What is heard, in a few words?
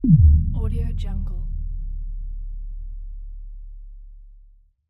bass drop